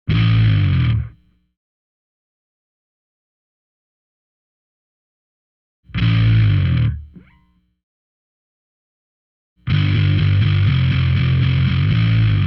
bass

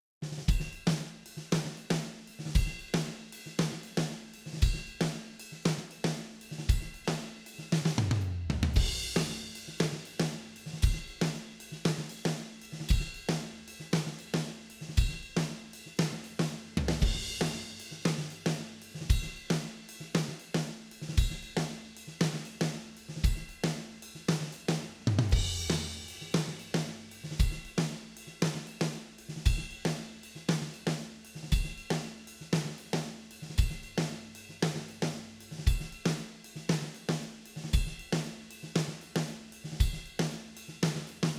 drums

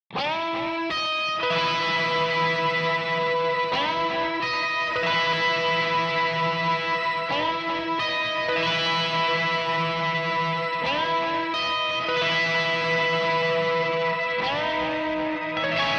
c gtr

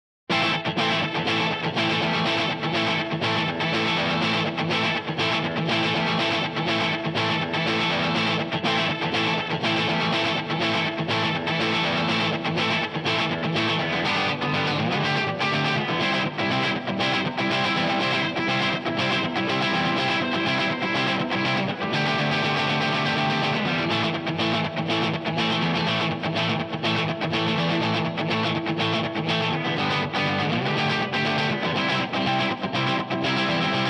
b gtr

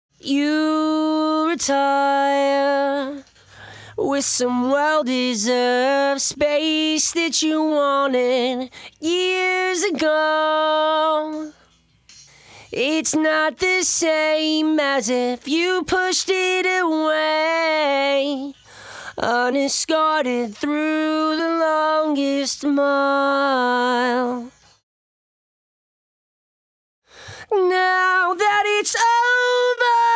lead voc

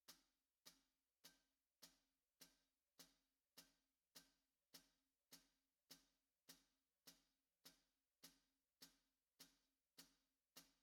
snare

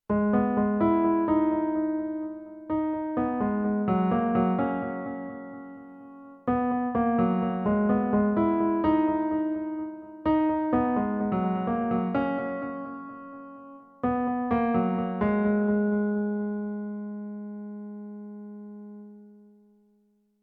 key

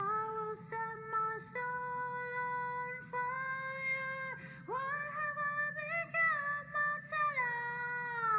high bgv

